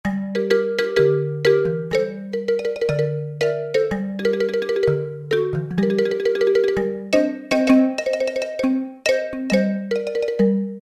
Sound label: Marimba; Roland PN-D50-02